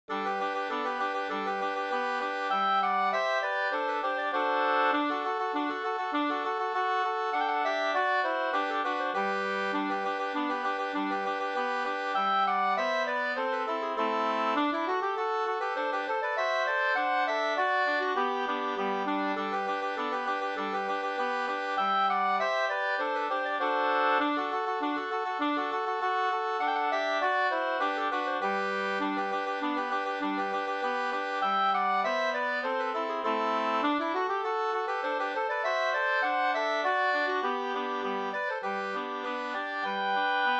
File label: polka, polketta; Spelmannens polketta e A Stromberg Sm 3st; med trestämmigt arrangemang av Lennart Bergström